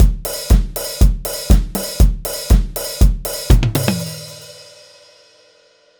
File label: tom